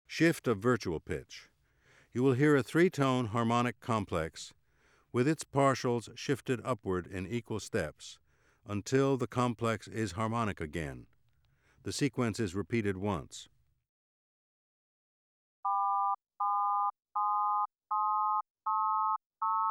Shift of virtual pitch, Auditory Demonstrations

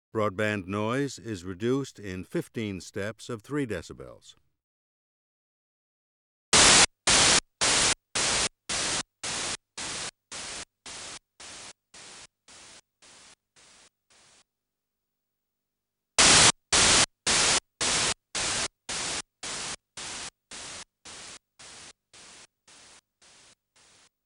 The decibel scale

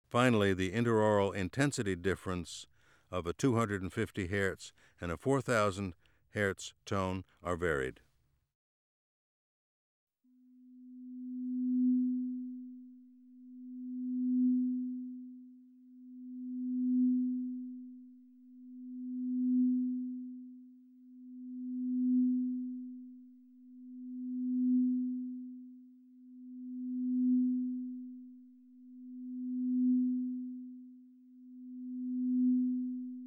binaural lateralization

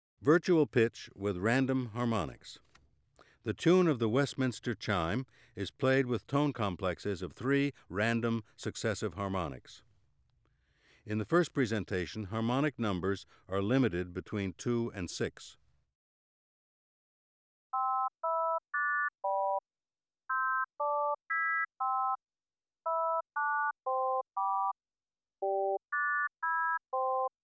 virtual pitch with random harmonics

